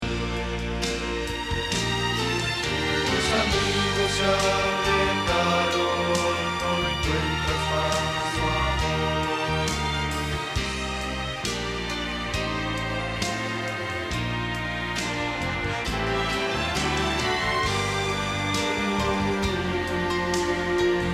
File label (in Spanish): Bajo